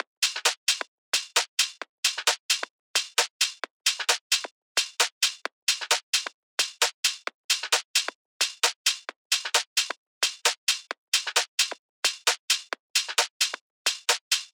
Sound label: Drum Loop 132 BPM Resample, Garage; Loop Stems; Cymatics; GHOST (Beta Pack); Centre Drum Loop